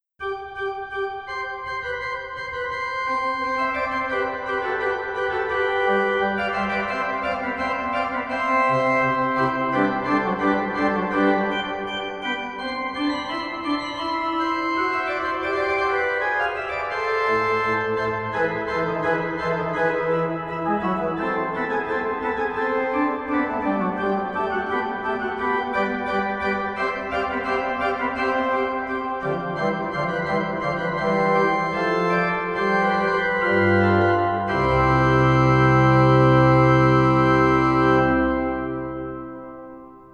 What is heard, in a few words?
fugue c major; pachelbel